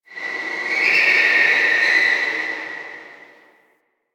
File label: Distant Car Squeal; Warframe; Ambience; Nineteen Ninety Nine